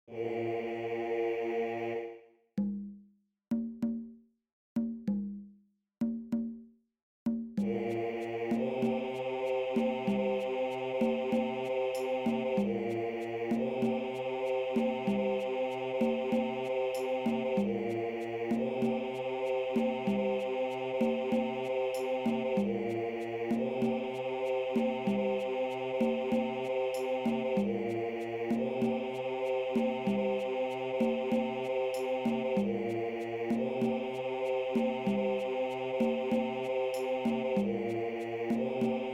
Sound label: The Sacred Circle BASS